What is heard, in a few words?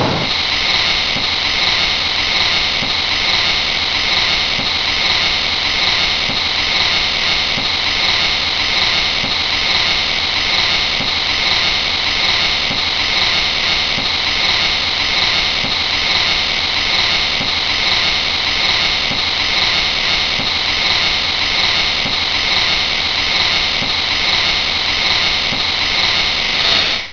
blowout; ambience; cstrike